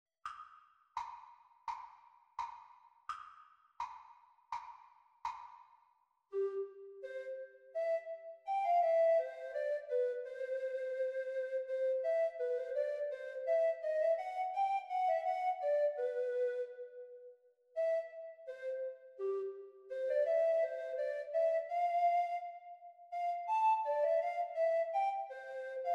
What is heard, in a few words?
Treble Recorder Performance speed; Grade 5; exam speed